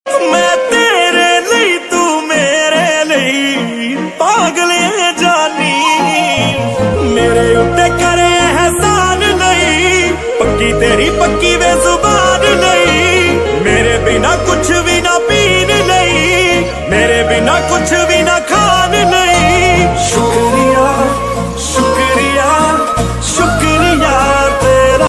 punjabi ringtone

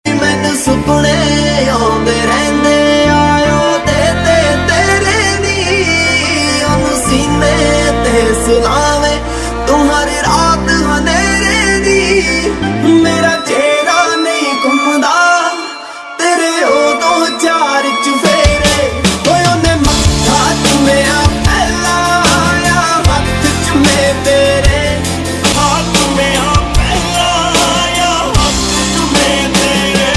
punjabi ringtone

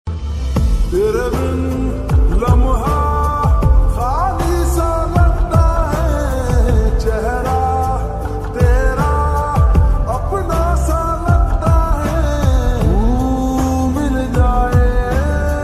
hindi ringtones

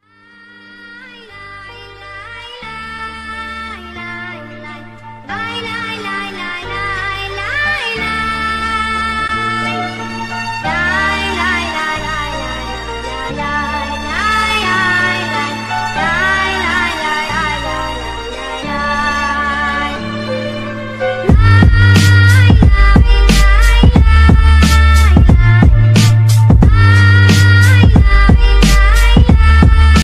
Sad BGM ringtone

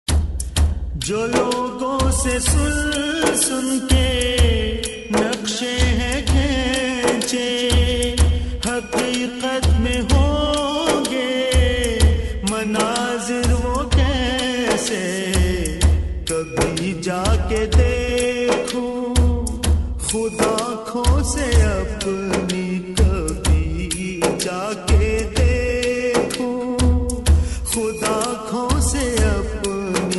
islamic ringtone

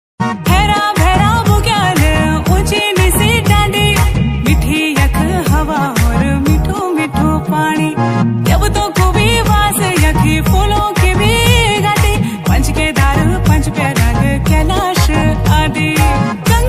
uttrakhandi ringtone

